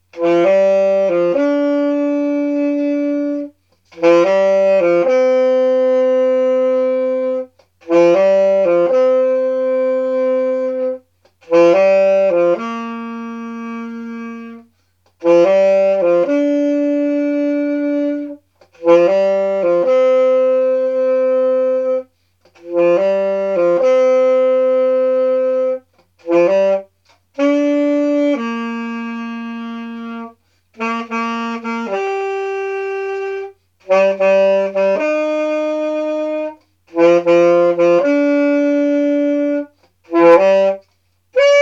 musique d avant debat

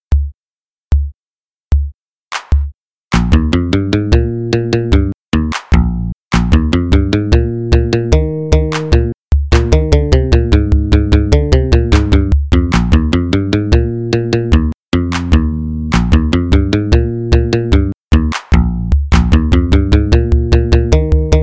musiqueta electro

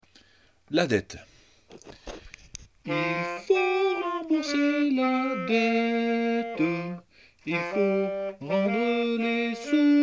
la dette chant

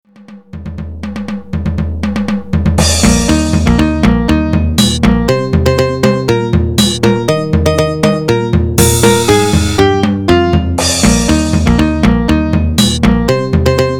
salut electro

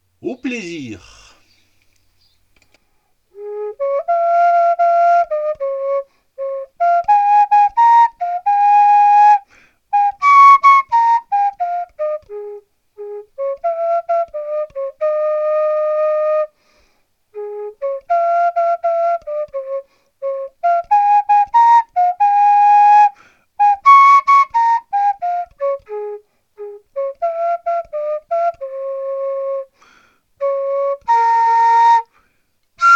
au plaisir a la flute

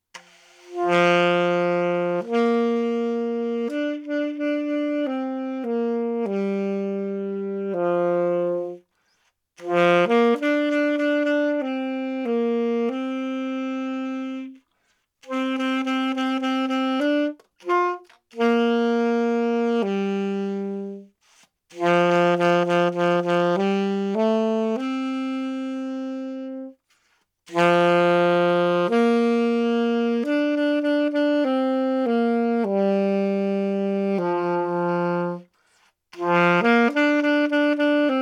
championne de l univers saxophone

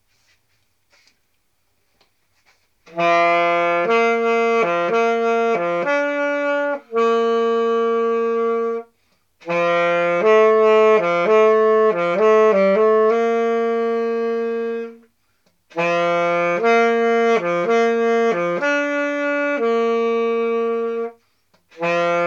rechauffement solaire saxo

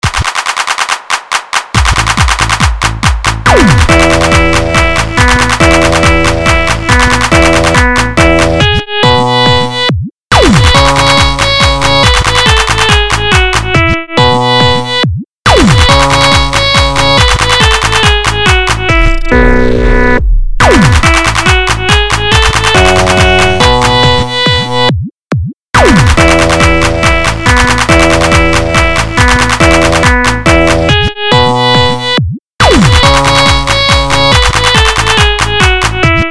grelons electro

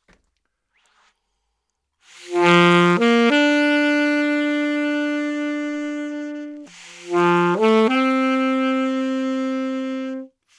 dans la lune saxo

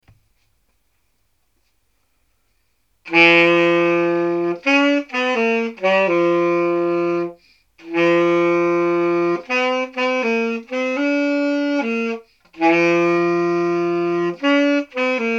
libre saxo

